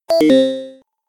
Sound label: alert down; thecryptoapp